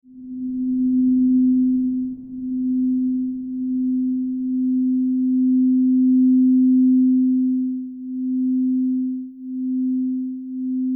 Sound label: DRONE BELL, Drone Bell Pulse Feedback Swells; Blastwave